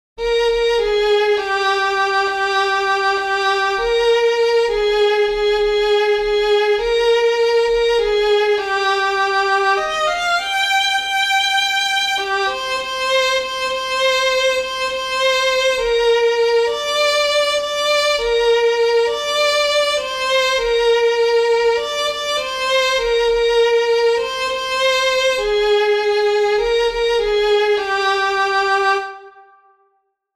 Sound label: vln